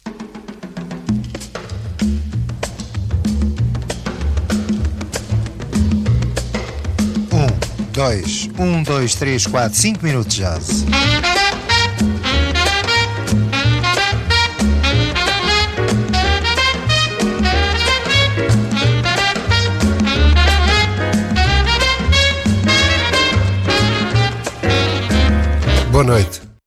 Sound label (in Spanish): jingle jazz; cinco minutos de jazz